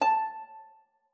HARP FORTE G#5; Xsample VOL-8

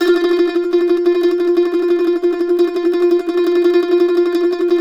MANDO TREM F4; Sampleheads; Whole Lotta Country 1 & 2

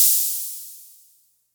Hats, Elektron MachineDrum

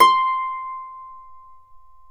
Celtic Harp, Plucked Strings

